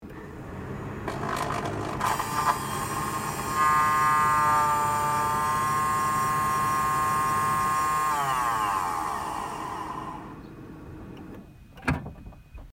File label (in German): Klima Durchlauf